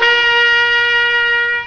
Trompette; HyperMusique

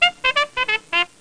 gagné

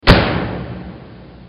tombe; MOBICLIC128

